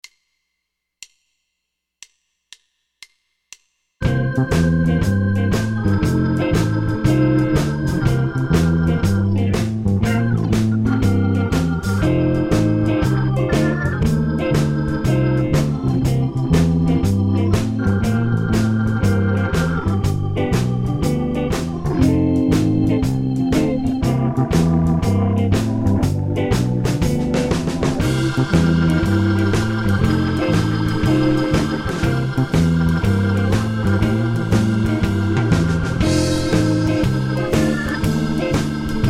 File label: Shuffle Blues C 120 bpm